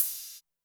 ride, Zoom MRT-3B

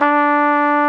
FLUGEL D4, WIND; AKAI S-Series CD-ROM Sound Library VOL-2

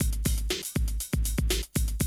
120, Classic Drum Loops

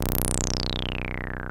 303 FAT, BASSES; Pro-Rec - Synthsonic

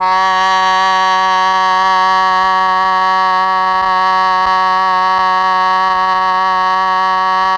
MEL OBOE

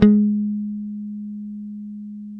G#4, Bass, GO-GO MUTE; Spectrasonics; Bass Legends CD 1

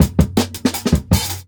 Jungle Breaks; Original Jungle Breaks

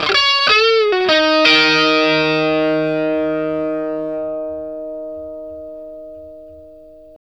Vintage Blues Guitar, 60, EF; USB Soundscan vol.22; AKAI

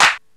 ANALOG COMBO; OMI - Universe of Sounds

